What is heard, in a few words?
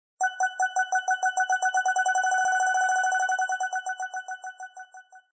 turn, 王牌麻将